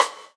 GATE RIM, Drums Acoustic; USB Soundscan vol.10; AKAI; 90s sample CD; GATEKIT 1

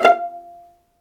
VIOLIN; ILIO; Synclavier Strings

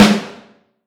Snare Drum; Q Up Arts; Johnny.C's Vintage Snare Drum; 6X14

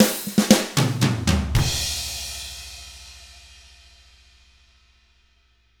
Drums; ILIO; Double Platinum Drums 1; R N B 6-8

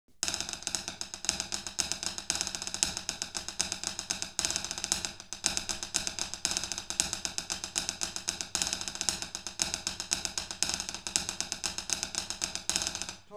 STIX ON FLOO

